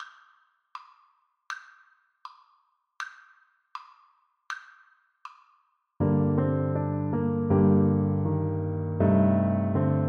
tchaik old french song, Tuba

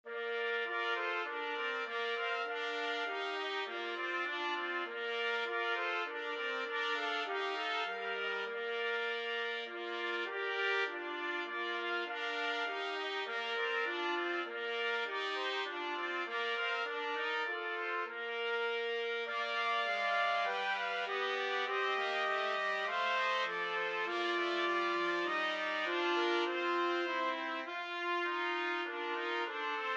angels trumpet trio

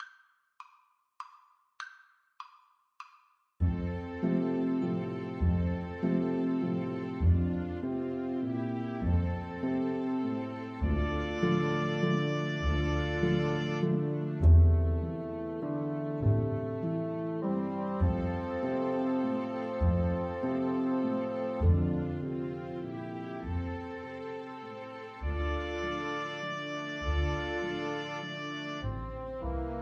waltz, Trombone

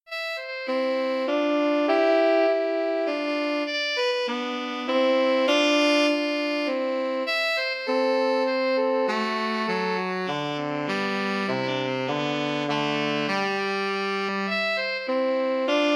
minuet, alto tenor sax duet

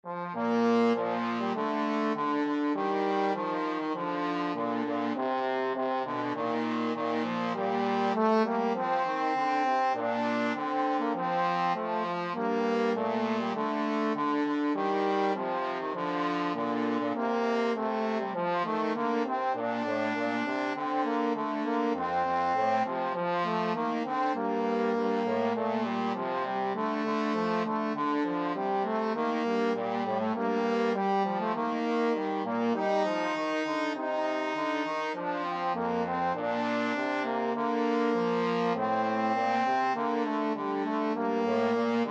trombone duet

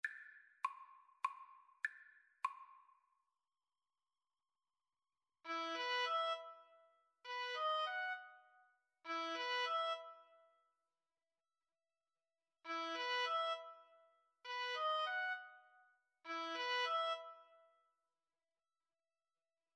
fur elise, Oboe Duet

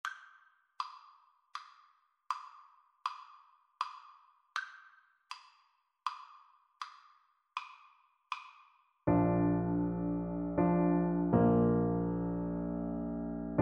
silent night, Baritone Saxophone

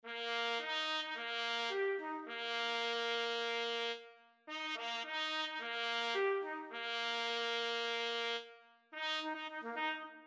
last post cavalry, trumpet

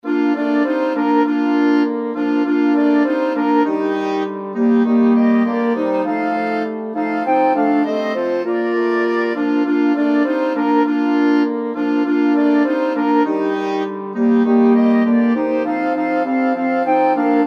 jingle bells, wind quintet

